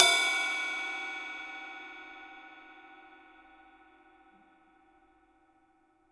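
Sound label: cymbal, Real Drum Kit, ROCK KIT; Best Service ProSamples vol.43 - Real Drum Kits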